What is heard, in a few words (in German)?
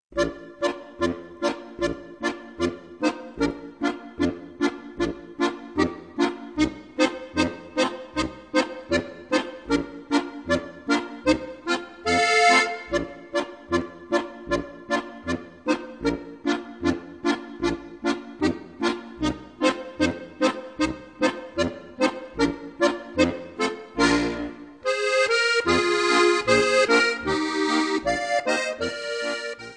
amboss polka